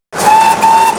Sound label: whistle, castle class